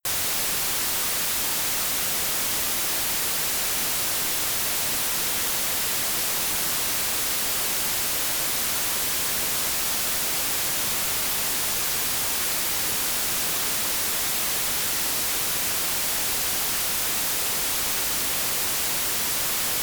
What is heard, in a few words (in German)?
Rauschwelten Weisses Rauschen; noise; Silentsubs